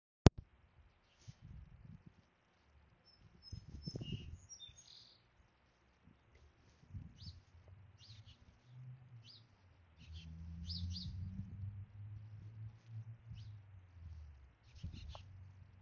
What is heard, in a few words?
Singing Birds in Wallingford Morning; Wallingford February Morning